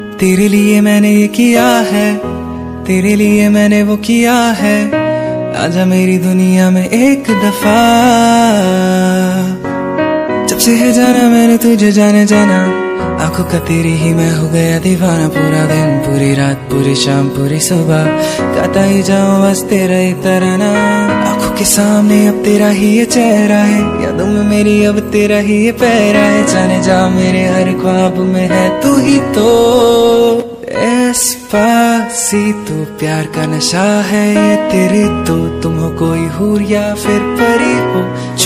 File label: Hindi Remix Ringtone